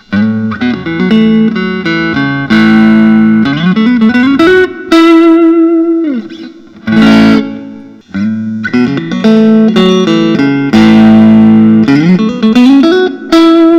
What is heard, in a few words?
paul reed smith silver sky frost metallic